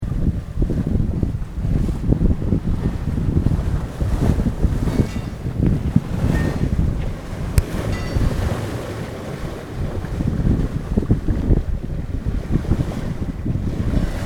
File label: red buoy